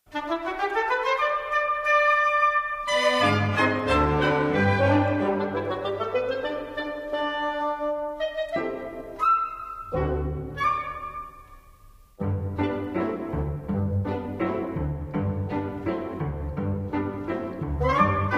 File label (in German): Wiener Melodie